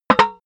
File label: paint metal tin lid dent; objekte; dose